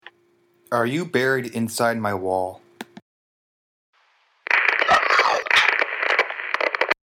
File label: EVP